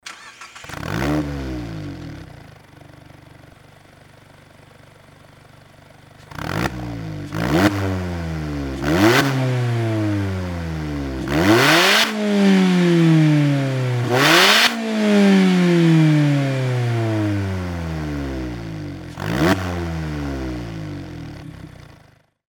nd roadster fujimura bf; nd5rc

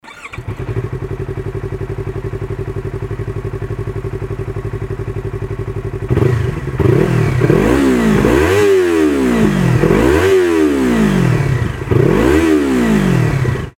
yzf-r25 akrapovic

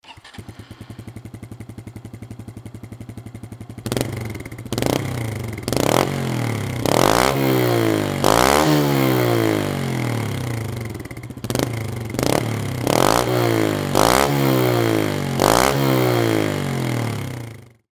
crosscub110; ja45; kitaco

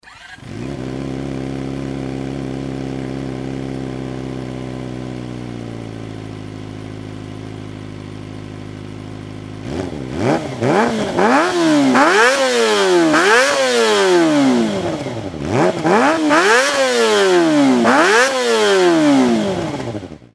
zx25r muffler; zx250e; beams

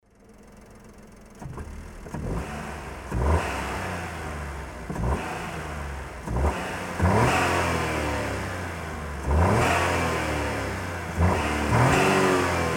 alto works ha36s ram air

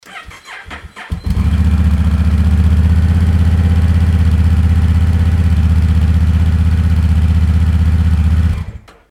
rebel1100 normal; sc83

